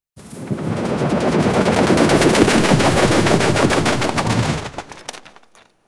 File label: cinematic sound, metal bending; 5 sec